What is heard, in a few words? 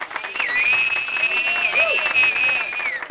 crowd; Ambient; MX vs ATV Unleashed; XBOX